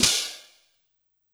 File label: Hand Cym. Short L, Yamaha PSR-E323 Symphony Kit